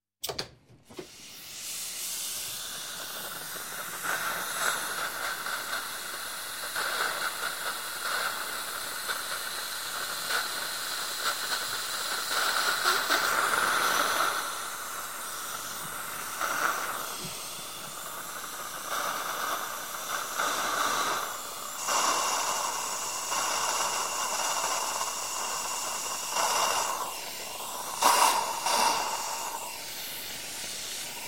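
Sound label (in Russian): звуки слюноотсоса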